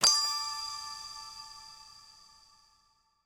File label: jackpot Gem; netent